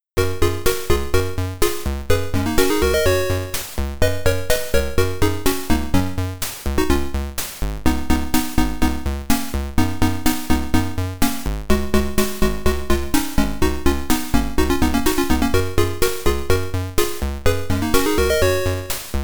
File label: Dance of the Reed Flutes, Sam Coupe; Lemmings